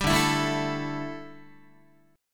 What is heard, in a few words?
D Suspended 2nd Suspended 4th D down Guitar; strummed